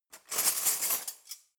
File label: cutlery knives drawer grab